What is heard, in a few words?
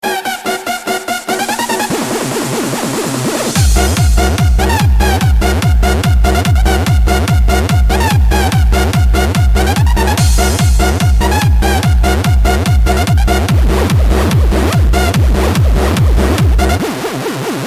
Hardstyle